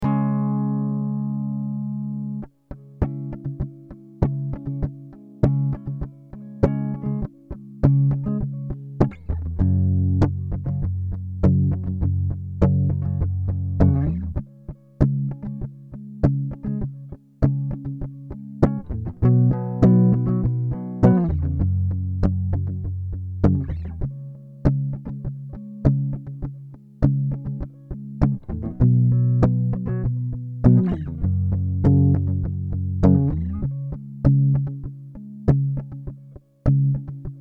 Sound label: C#m100 acoustic